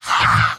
m laugh short; heavy; tf2; garrysmod; mvm

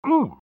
pain sharp; pyro; Garry's Mod; tf2 content

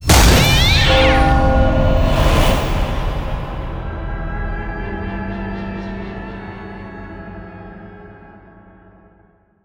quest turn in accepted halloween

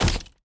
fly walk

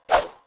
cbar miss, weapons; tf2

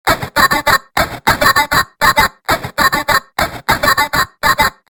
panic; sprunki secret; sprunkirebel

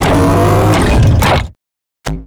deploy giant; Garry's Mod; tf2; mvm

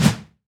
projectile swoosh; Garry's Mod; tf2; passtime

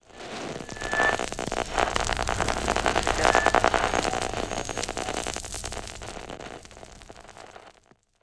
data trans random, citadel; ambient; Garry's Mod

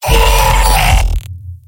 m pain severe; pyro; tf2; garrysmod; mvm

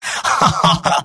scout laugh evil; vo; tf2; mvm

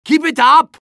cheers; medic

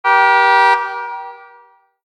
car horn; Garry's Mod; tf2; mvm warehouse

